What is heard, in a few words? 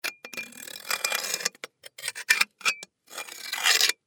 metal scraping eerie